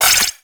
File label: Igniter Pistol Mech; Warframe; Weapons; Corpus